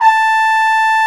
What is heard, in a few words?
French Horns B3